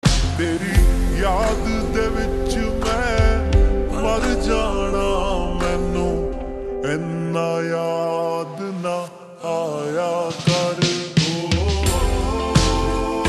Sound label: Ringtone, slowed and reverb